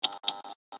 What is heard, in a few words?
Flashlight Flicker; Lethal Company; Windows